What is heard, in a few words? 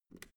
switch small turn off soft